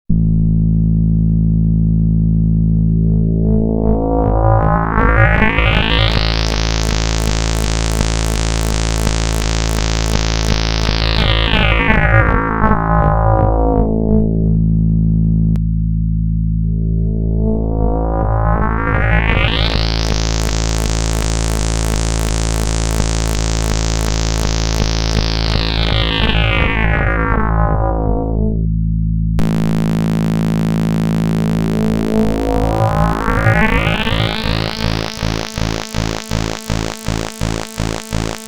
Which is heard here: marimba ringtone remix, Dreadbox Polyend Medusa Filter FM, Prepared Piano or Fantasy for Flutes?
Dreadbox Polyend Medusa Filter FM